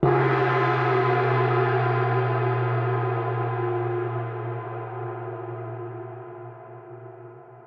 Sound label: PERCUSSN ETHNO 125, Drum